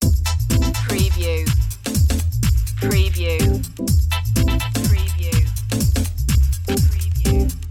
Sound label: dance loop